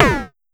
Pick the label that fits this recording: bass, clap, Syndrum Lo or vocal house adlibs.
Syndrum Lo